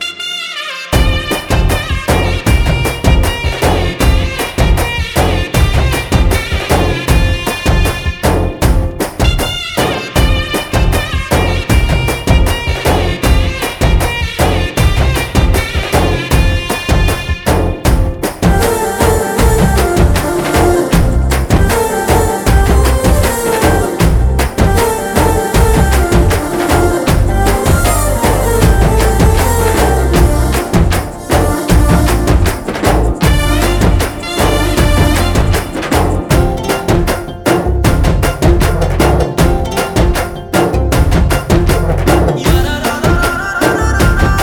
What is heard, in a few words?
BGM Mix